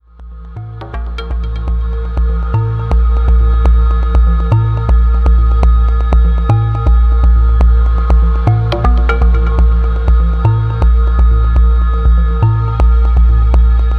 horns break mix

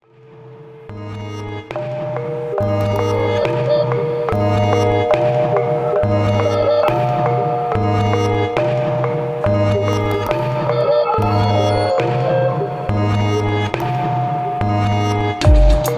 gamelan sketch

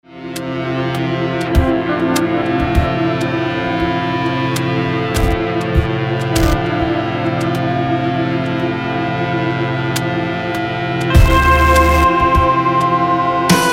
drone

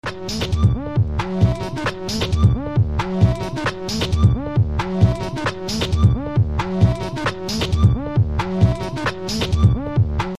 loop

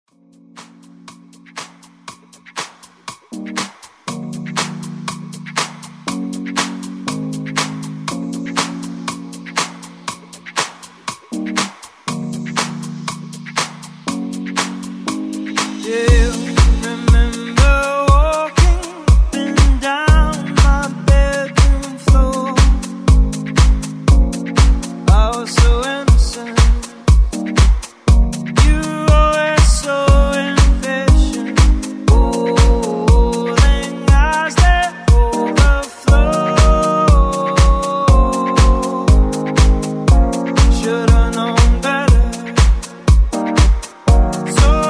heartbeat

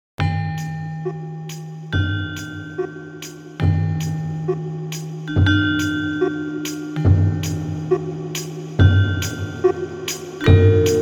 gamelan sketch

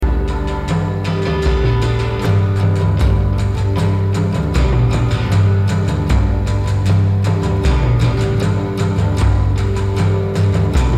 cumbia

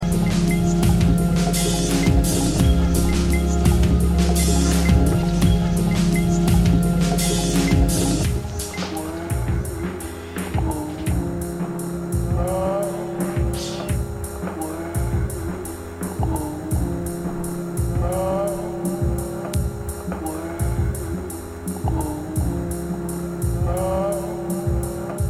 bubbles

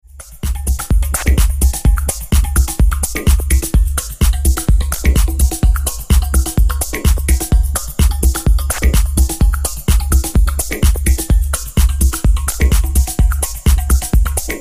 glockenspiel

